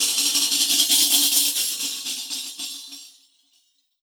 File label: tamb, percussion